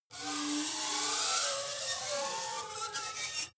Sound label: metal screech phaser edgy